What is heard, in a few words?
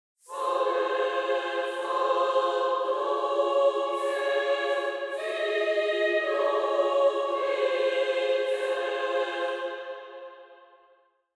Angelic choir angels celestial voices; sing your praises